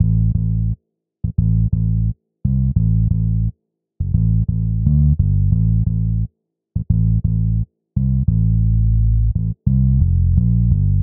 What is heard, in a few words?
Bpm87 A Sleazy Bass